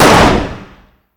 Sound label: fire dist 9x19 pistol int